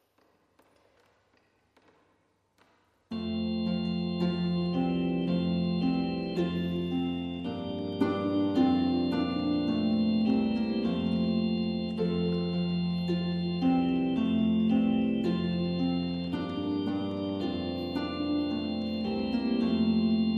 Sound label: Harp Deck The Halls